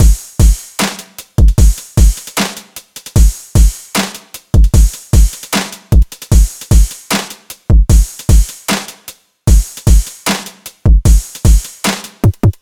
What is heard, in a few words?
trap 152 bpm; monosounds